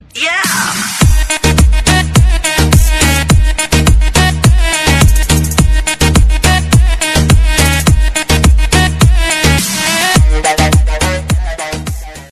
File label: arabic remix